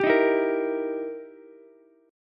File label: E Minor Major 7th Flat 5th, E, down, Ukulele; strummed; JGuitar